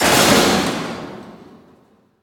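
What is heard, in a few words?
Sheet Metal Stack Drop; Prison Architect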